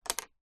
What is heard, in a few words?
food ice lolly stick wooden down on table; zapsplat